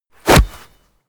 basketball hit basket

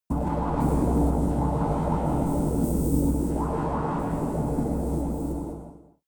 space inside biomedical alien power plant

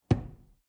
drawer medium close lock

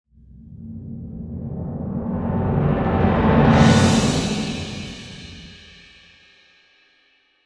drumroll and crash

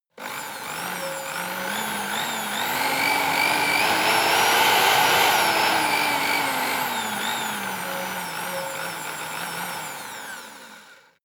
servo revving

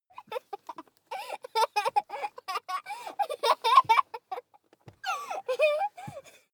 child laugh tickle

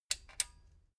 metal button stereo click disable slow

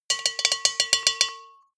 bowl hits rattle fast

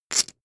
poker chip stack rattle short

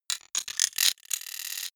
sci-fi electromechanics small stutter tonal

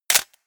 camera

